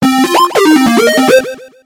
bonus win, pinball

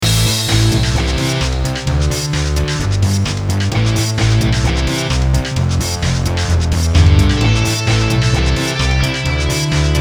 menu soundtrack, racing

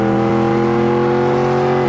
engine, racing

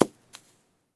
hit ball, minigolf

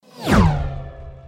gate, pinball

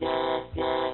honkhonk low; 3dcity

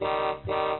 honkhonk med; 3dcity

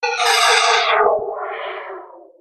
ball out, pinball

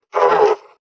zombie angry

